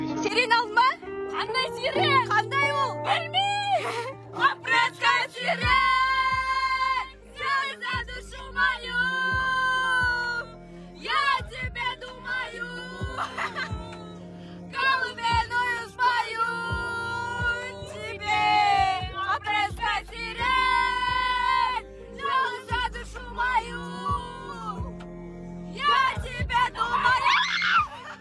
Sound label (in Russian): Девочка поёт